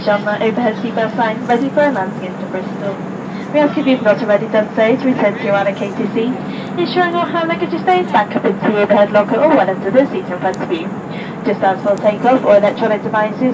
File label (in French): Avion